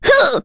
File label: Vox Damage; Maria; kingsraid; voices heroes en